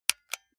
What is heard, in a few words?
console controller xbox rt press